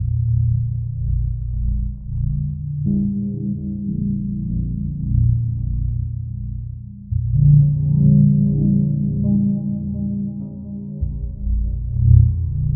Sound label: In Dark Places, Dark; Zero-G Ambiosis